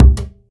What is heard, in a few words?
punchy bass drum one shot C key